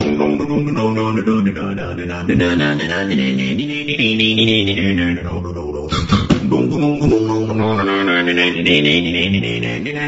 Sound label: beatbox; verbalase; tetris